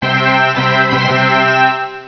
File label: Jingle Quiz End; Gotouchi Kentei DS